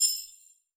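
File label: ui reward receive each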